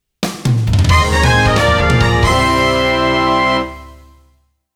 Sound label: I did it! (FANFARE); mushihimesama bug panic for iphone ipod touch original sound track 2011